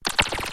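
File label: wind close ringing bright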